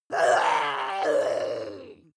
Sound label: witch growl; kreaturen